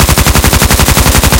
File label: kac loop; Escape from Tarkov